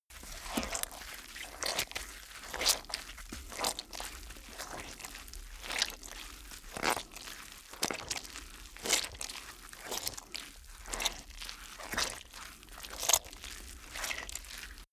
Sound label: blood squish